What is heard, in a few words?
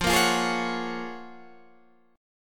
F sharp Major 9th